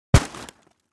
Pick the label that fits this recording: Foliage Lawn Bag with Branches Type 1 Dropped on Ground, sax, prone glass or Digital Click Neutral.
Foliage Lawn Bag with Branches Type 1 Dropped on Ground